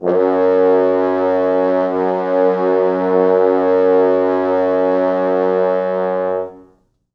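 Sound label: French horns sustain F#1; East West; Quantum Leap Horns Sax; 4 FrHorns SUS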